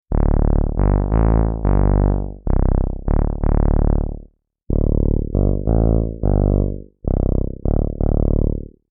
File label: Tuba Preset